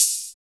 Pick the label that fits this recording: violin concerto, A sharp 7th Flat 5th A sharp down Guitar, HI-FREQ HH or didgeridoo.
HI-FREQ HH